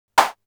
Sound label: res clap, Polivoks